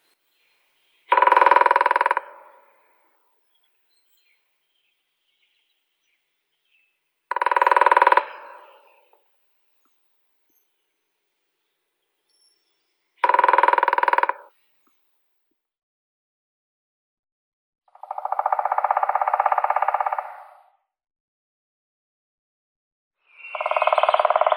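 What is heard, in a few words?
grey-headed woodpecker, Picus canus; dyatel sedoi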